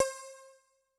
Syn Pf; Yamaha DTXpress-II

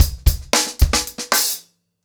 Hip Hop 110BPM, acoustic drums drumloops; The Roots Drumloops; Hail Hail Hip Hop

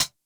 999 Hat Closed, drum kit; Concrete Collage Drum Kit; Concrete-999

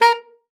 sax a#4, Saxophone stacc forte

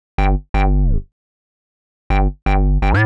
Hard Synth Bass A, 125; PowerFX - Nu Jazz House

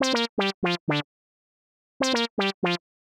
120 Mono Synth, Keys, Nu Jazz House; PowerFX

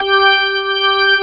ORG, Organs

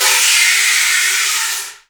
bull blast, Heart of Africa, kudu FX

